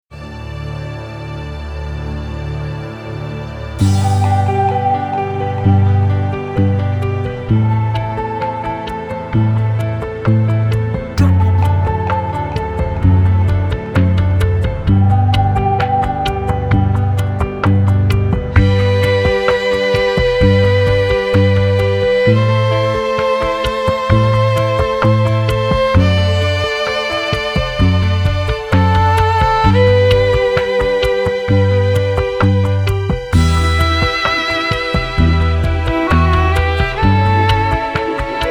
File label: ave maria bach, violin